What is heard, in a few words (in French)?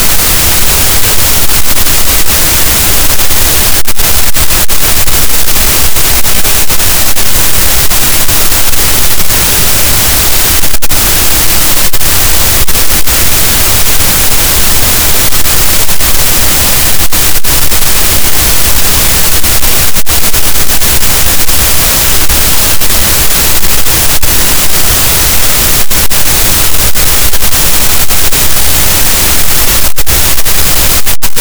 cachalot, gaussian noise; Physeter Macrocephalus